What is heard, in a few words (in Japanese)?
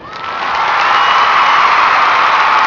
kansei, cheer